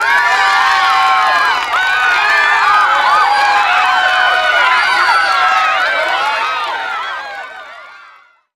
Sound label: ambience, cheer